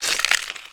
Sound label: bite; munch